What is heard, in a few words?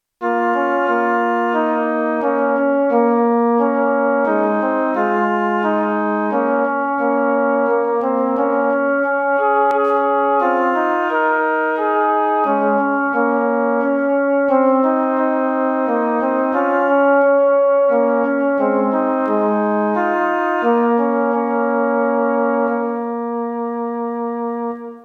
Siren pipe